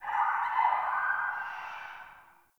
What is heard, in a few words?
Day Distant Creature; Ambience; One Offs; Glades Of Andurin